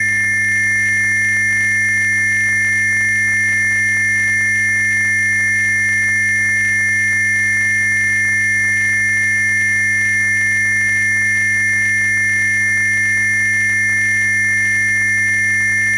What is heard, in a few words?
signal interference; sonniss gdc